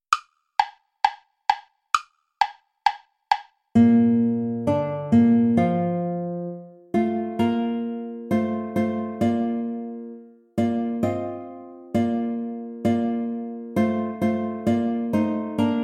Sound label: play along without guitar